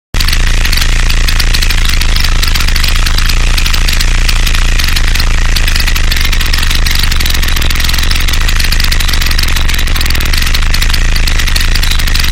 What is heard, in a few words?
Morning Noise Broadcast